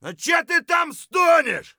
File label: fight; Escape from Tarkov